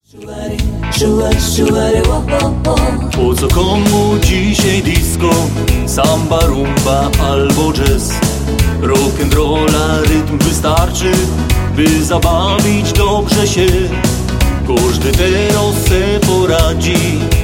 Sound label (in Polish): z rock and rollem